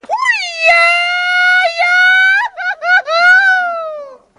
mariachi

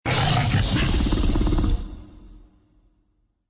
ronroneo

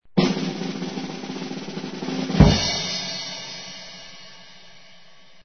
tambores intriga

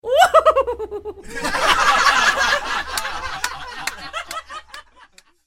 risa ujujuju risa

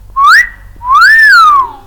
silbido

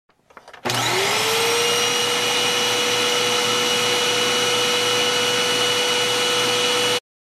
aspirador

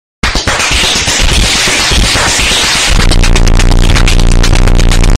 aplausos celestiales

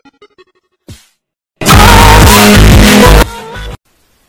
guitarra; la guitarra de lolo

